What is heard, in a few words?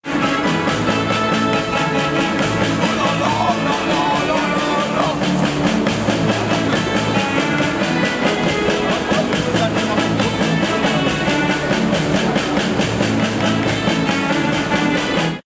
bawling; pacification data